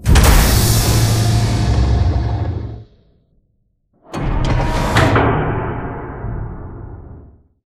Giant Floor Panel Lower Rotate Mech; Boomtown; Sideways Town; Titanfall 2

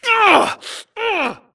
grunt Struggle; Titanfall 2

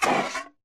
boost glide start; Titanfall 2

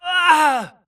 grunt death quick; Titanfall 2

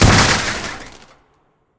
Explo Damage State Light; AI Reaper; Titanfall 2

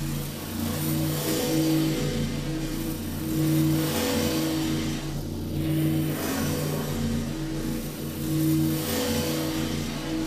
Cloak Sustain; Titanfall 2

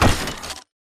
footstep shuffle; Titanfall 2

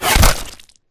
bullet generic head kill shot human; Titanfall 2

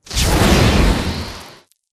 atlas dash close; Titanfall 2

